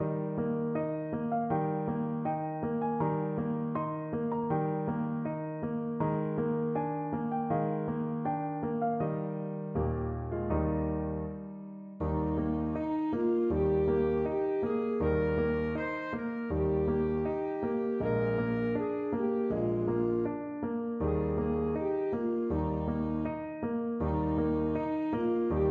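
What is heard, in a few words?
alto saxophone; long long ago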